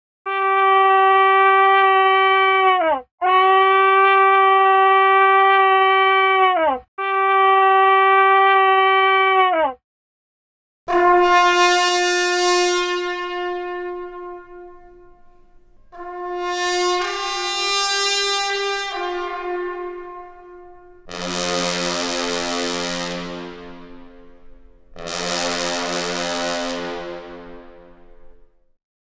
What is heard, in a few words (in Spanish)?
Instrumentos musicales. bucina cornu